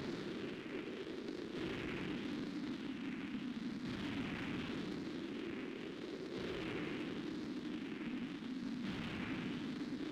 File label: storm